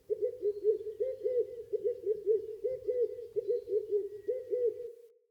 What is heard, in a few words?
Wetland Forest Day Dove; Midrath Island; Ambience